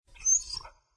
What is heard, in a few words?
dog whine; smite; scylla